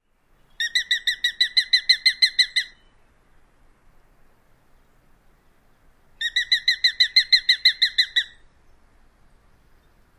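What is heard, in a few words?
sharp-shinned hawk alarm calls near nest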